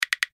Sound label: nudge; Four Beauties